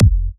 Kick; WAProd Free Kick Samples 2018; WA Kick Pack 4

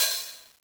"ohh"; Angelic Layer

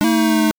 8bit

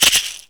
SE GAME BREAK; maimai

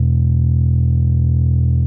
Bass E1; 音MAD; 孤独摇滚

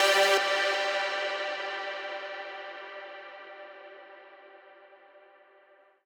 lead

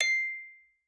musicbox

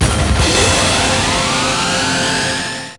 SE GAME SLIDE; maimai

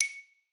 xylo

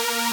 synth

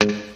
Guitar G#3

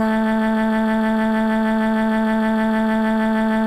Bass; 音MAD; 少女终末旅行; 소녀 종말 여행 음원팩

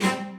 strings

